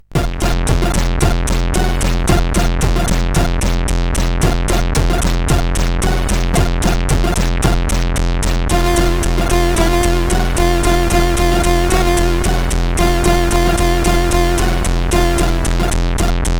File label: Heavy Whispers, CSG8580R5